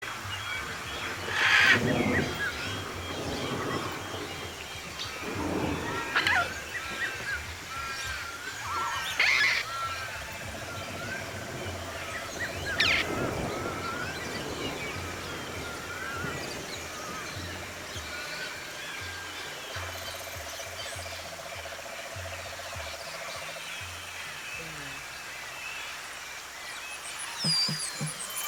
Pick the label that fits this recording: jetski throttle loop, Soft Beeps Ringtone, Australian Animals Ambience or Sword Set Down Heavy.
Australian Animals Ambience